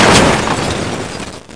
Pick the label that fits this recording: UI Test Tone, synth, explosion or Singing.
explosion